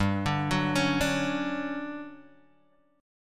D-Major 7th G; DM7/G Chord {3 5 4 6 3 X} arpeggiated

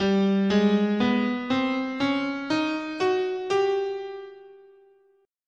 G Persian, scale

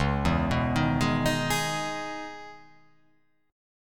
C sharp Minor 9th C sharp, chord

